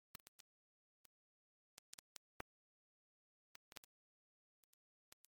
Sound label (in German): knacks